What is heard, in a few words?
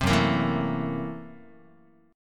F sharp 7th Sharp 9th Flat 5th Guitar, chord; 4A,4E,5A,5D,5Gsharp,6Csharp; strummed; 3,2,1,2,1,x